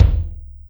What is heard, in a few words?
BD, Yamaha DTXpress Acoustic Kick; BDbonzo2